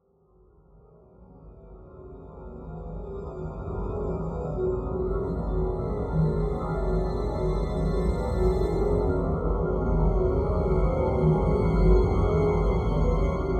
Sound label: Horror Texture Loop Upside Down